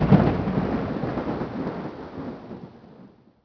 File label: thunder; Soultree